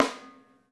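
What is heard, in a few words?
Drums Acoustic, raw kit snare drum; AKAI; 90s sample CD; USB Soundscan vol.10; RAW KIT 8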